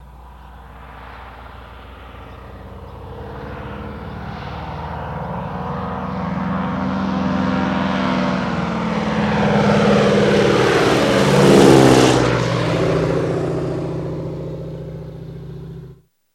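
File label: DHC Med By Rev, Prop Plane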